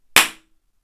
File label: studio clap double sonex